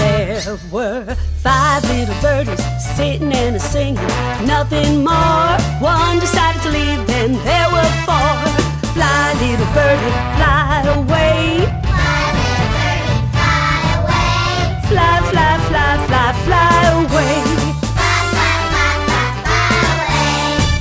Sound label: Big Band Birdies